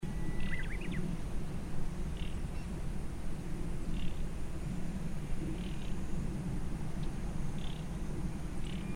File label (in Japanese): ooyoshikiri jinaki